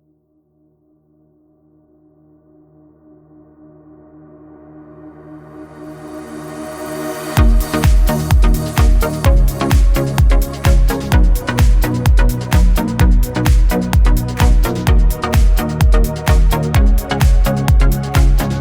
Random Sylenth Test

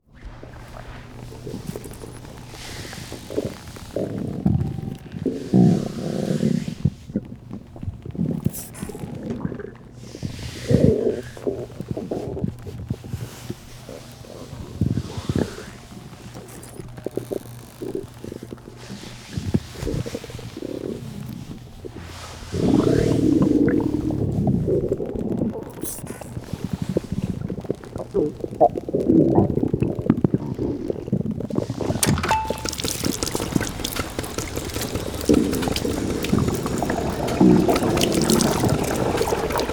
food machine weight gain stuffing audio

